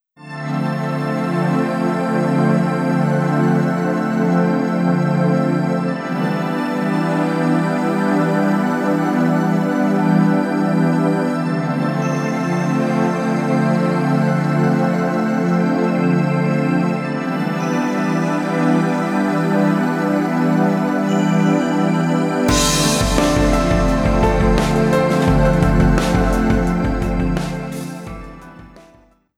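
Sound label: background music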